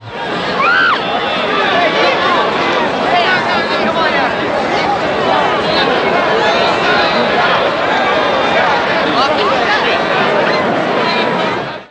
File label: Ambiences, Places and Ambience